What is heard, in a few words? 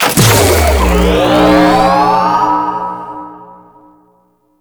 Hunt Activate Extraction; Railjack Hunt